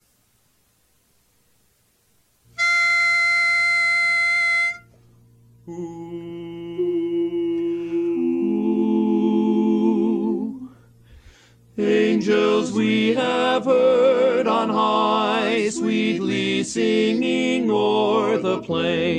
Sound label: Angels Lead